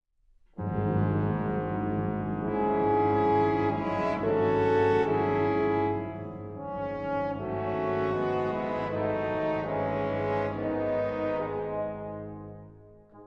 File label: Brass; Gadfly Suite; Brass of the Royal Concertgebouw Orchest